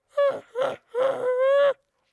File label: baby camel; prosoundfx